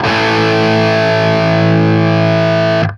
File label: 5TH A, Guitar; East West; Steve Stevens Guitar Samples Collection